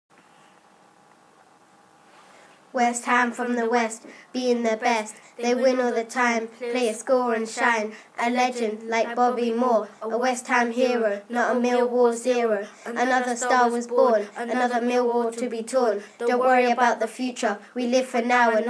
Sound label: WEST HAM WINNING RAP